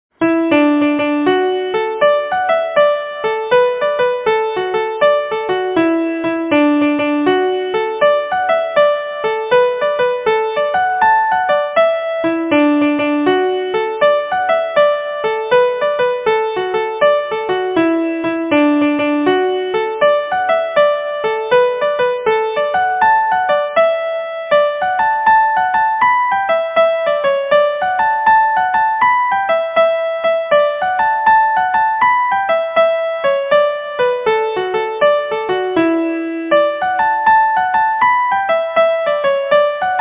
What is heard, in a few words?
carraroe jig